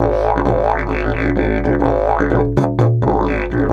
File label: 130, Didgeridoo; Big Fish Audio - Didgeridoo and Other Primitive Instruments; 130BPM